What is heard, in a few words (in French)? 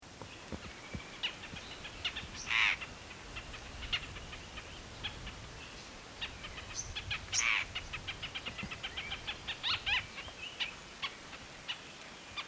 hemiprocne couronne, chant